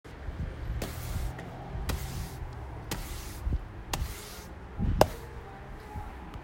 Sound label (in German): Kehren